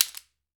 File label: AFRICAN, African Perc; E-MU Formula 4000 Series Vol. 4; Earth Tones